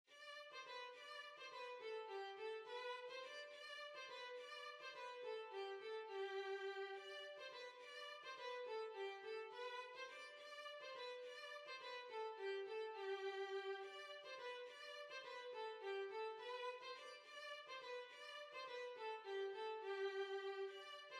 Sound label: Violin